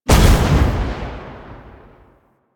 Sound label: Spear Gun Shot Proj Explosion; Weapons; Warframe; Tenno; Hoplite